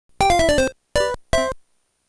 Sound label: Lose Theme (Death); Super Mario Land; DMG; GB